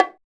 BGO HI, BONGOS; Drums In Your FACE!

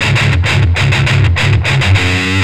Riff, Industrial Nasty

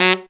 TEN F# QUA, Phantom Horns TENOR FX; Zero-G

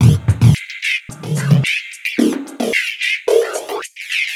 Loop; Sonic Foundry (Sony Creative Software); Esoterik Beatz; Cricket

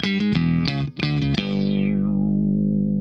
SHOT, Funk Guitar; 90 s Sample CDs; Zero G

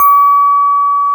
KEY EP2 C 2, Roland, E.Pianos, Digital EP's

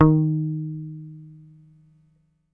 HOF SUS D#3, Hofner bass; Sampleheads; Will Lee Bass Library

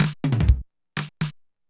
Loop, mxr; classic machines

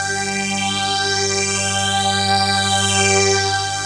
PAD String; Best Service Gigapack 1

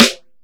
Snare OS; Rondo Brothers Trip-Hop Loops & Samples; Until We All Fall Down

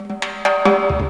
FILL TIMB, Ragga & Dub Reggae; USB Soundscan vol.27; FILLACOUS

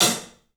PAHAT, 3D Drums, LITE CLUB HT; 3D Sound

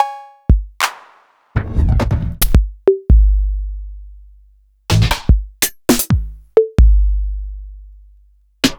yamaha rm50

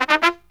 TRUMP, Killer Brass Riffs

